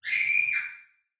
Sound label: Scream Female